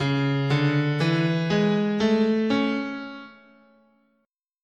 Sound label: Db Balinese Pelog, scale